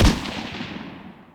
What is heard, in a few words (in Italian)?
Artiglieria; BLIND QUEST - The Enchanted Castle